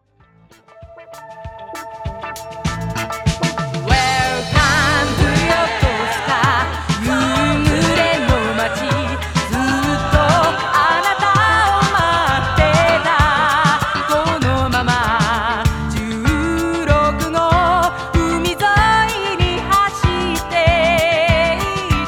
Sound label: j pop female